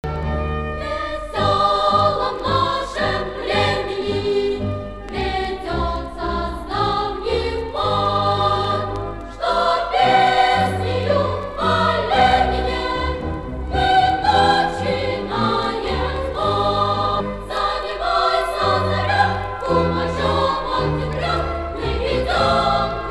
music

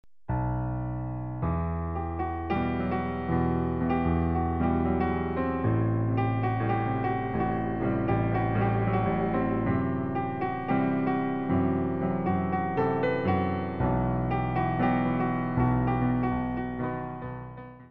piano

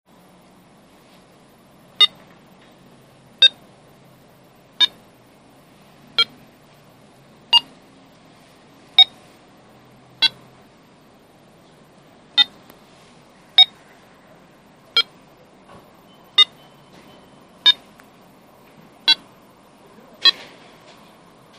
music

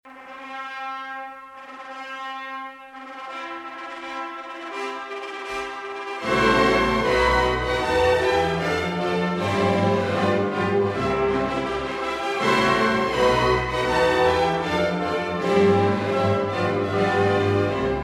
music nada

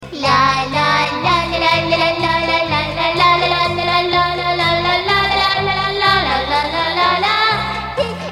simple song

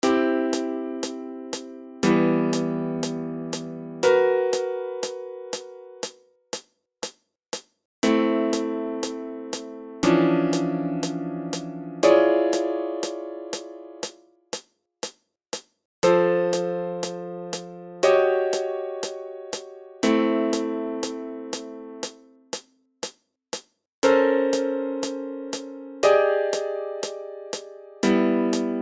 piano

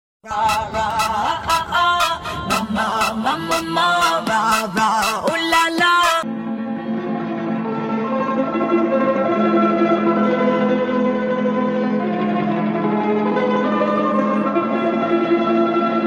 music

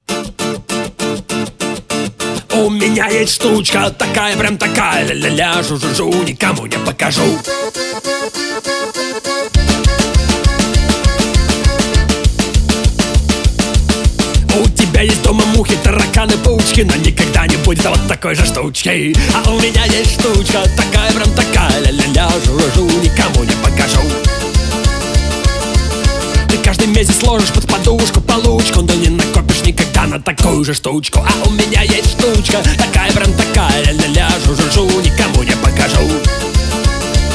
music

